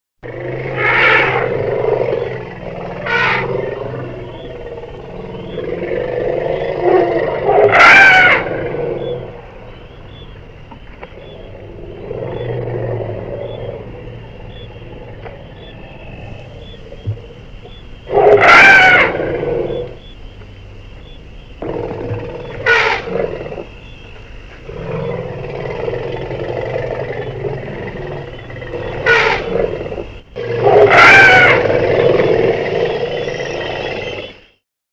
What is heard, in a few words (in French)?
elephant